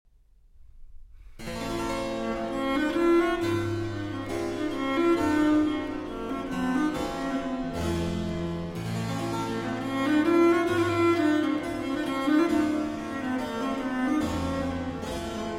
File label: Trio Sonata in B Minor, Chaconne Gracieuse